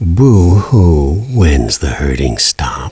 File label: hurting stop